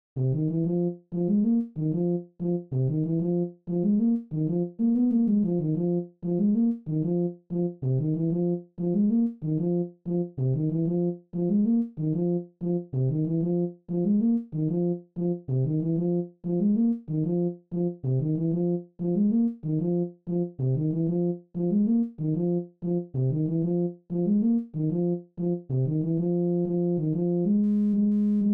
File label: Euphonium; OSS 117